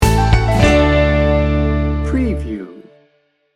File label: sweet happy upbeat folk pop sting bumper